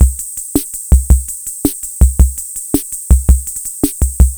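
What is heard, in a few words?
S-ROCK, drum machine; Crypton - DrumBase5000; TR-66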